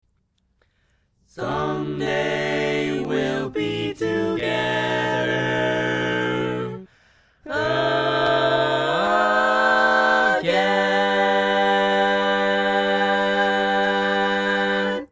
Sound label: Bass, barbershop